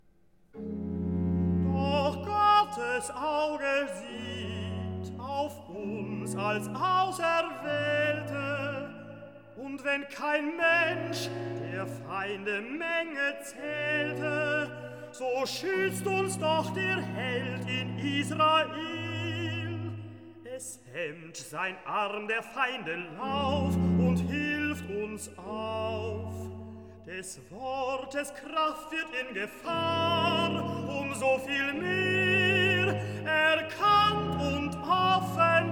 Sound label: Recitativo Tenore; Bach Johann Sebastian; BWV0090; Es Reißet Euch Ein Schrecklich Ende; Doch Gottes Auge Sieht; Kantate Trinitatis 25